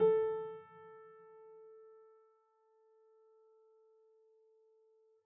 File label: piano sampler